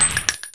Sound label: bullet, weapons